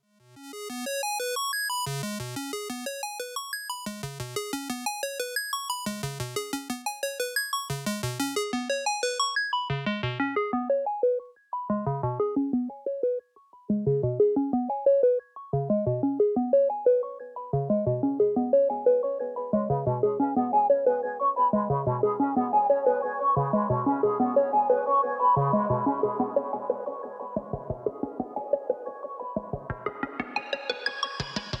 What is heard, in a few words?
Drone; Final Drone; Samples for workshop; shaping sounds